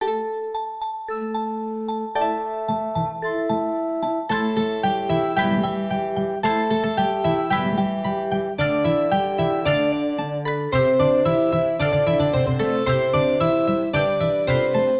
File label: piano bgm